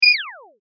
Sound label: Sci fi laser tail, Laser: Release From Firing Scifi Style; Lasers